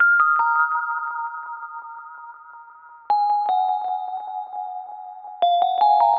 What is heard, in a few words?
Melody Loop - 155 BPM D# Min Bell; Melodies; Loop Stems; Cymatics; Oracle Sample Pack